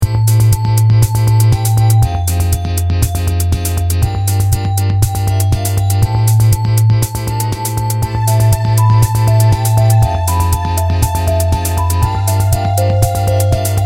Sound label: minimal